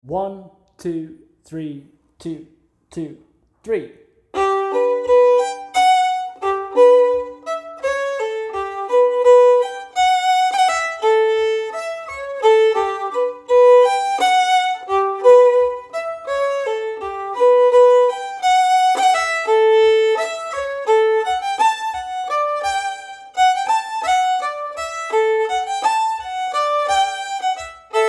Fiddle Steady; The Bonny Miller

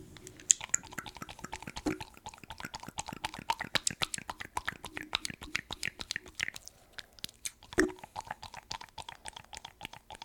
Wet penis noises; Foreskin ASMR; I have a two inch foreskin

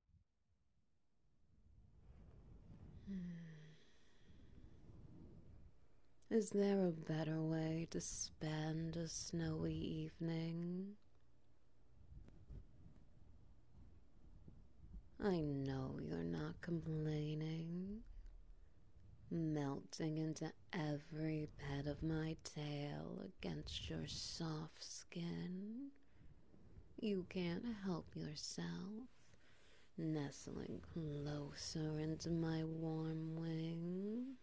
SFX Wet Noises; Missed Opp Dragoness Cuddlefuck

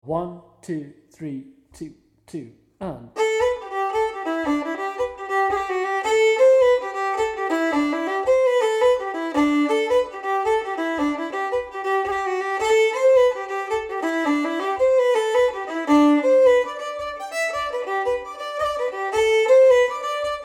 Give Us A Drink Of Water - Fiddle Full Speed

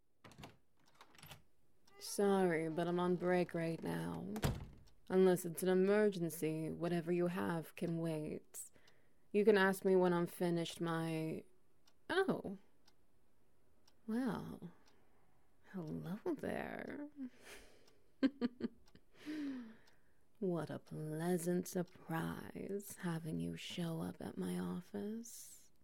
Ambience, Wet Noises; Lunch with Dr Bardot

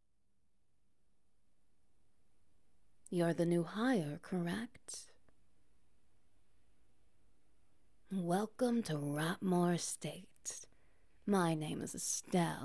Wet Noises; House Rules

